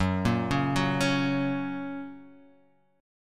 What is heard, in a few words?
Gb Major Gb down; Gb arpeggiated; JGuitar